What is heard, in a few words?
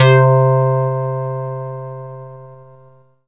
SQ80 PLINK, Synthesizers; Soli-Music; World of Synthesizers VOL-1